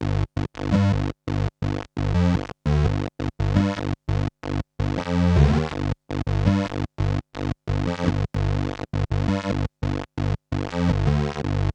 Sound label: Synthline Original